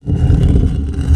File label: stone small start